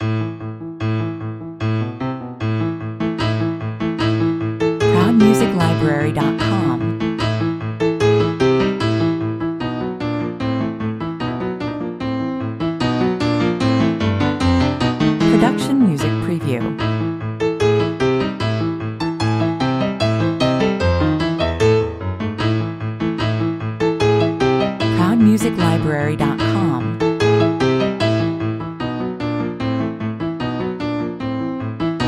pianosolo 150 bpm

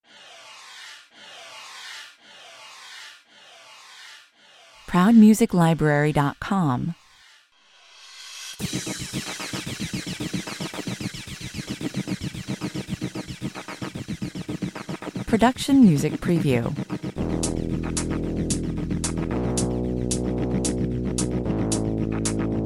bass and drums, 112 bpm

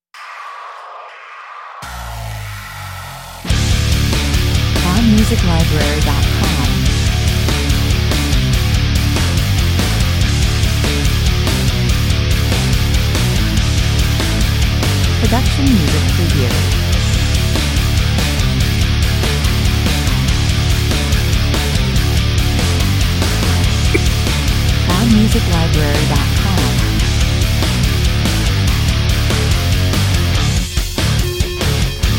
bullet train 143 bpm

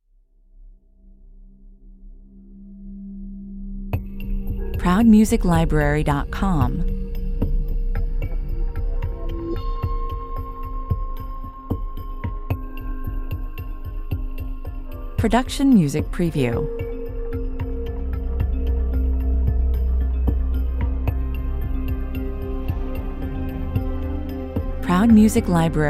underwater mystery 112 bpm

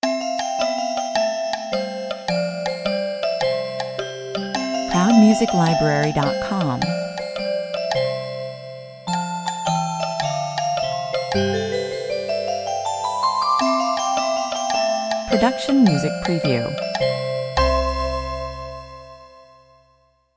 childrens play

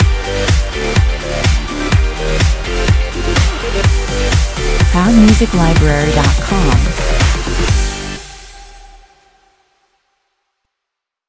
stinger

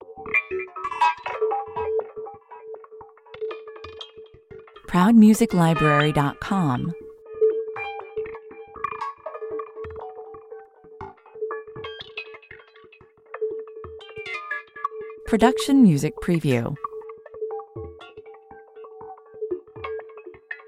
electronic angst 120 bpm

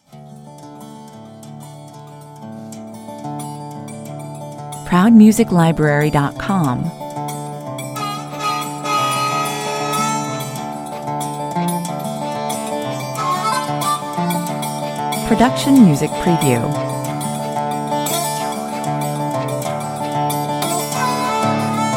no drums or bass

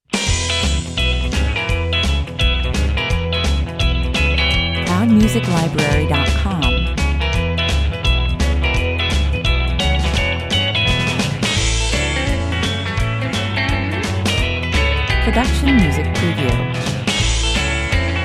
rockabilly man 85 bpm

